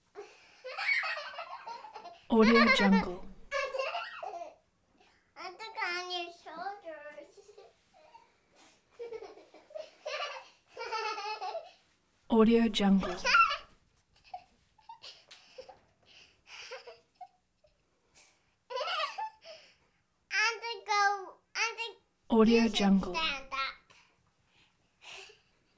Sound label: child giggle